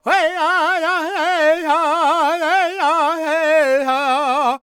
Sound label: World Voices, Lakota; Spectrasonics Vocal Planet CD5